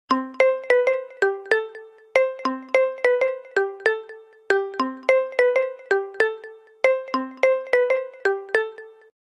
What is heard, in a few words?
samsung galaxy note 9 pro